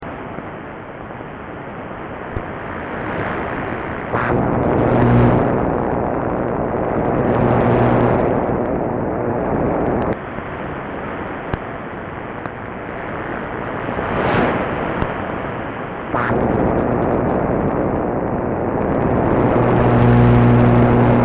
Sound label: voc interefence